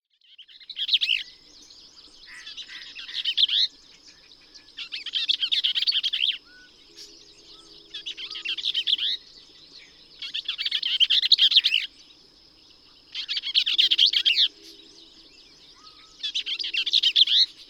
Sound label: Bell's Vireo